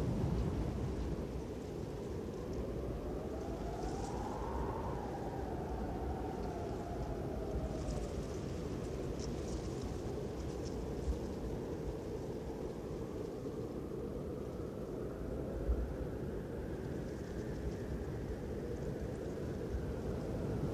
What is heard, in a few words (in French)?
vent